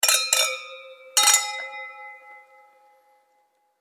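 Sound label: sounds of clinking at a celebration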